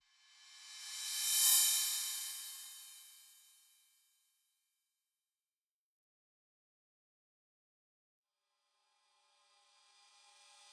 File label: DRUMS; Ghosthack; Heavy Vocals; Construction Kit